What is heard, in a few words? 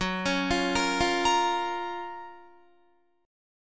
Gb Major 7th Gb down Guitar; GbM7 arpeggiated; JGuitar